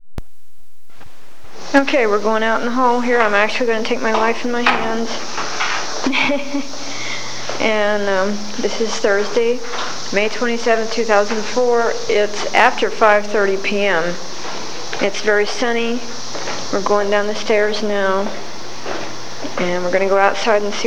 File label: cicadas